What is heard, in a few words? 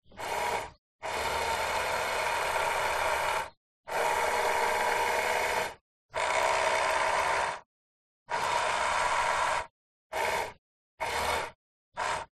AUTOMOBILE S-TYPE Jaguar Mirror; 2000; fox audio